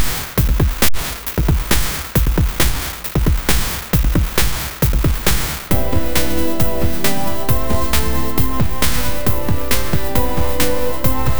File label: calm breakcore